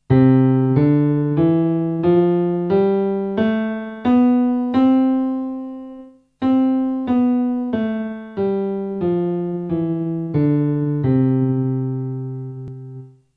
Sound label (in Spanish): Escala Do MD 1 oct